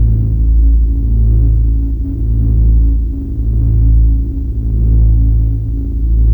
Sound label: wand teleport sigil loop